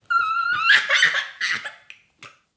baby laughing; human

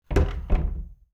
closet door close

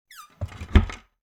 cabinet door close

